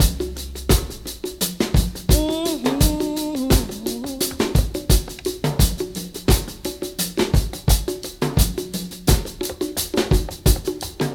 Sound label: 86 bpm breakbeat sample g key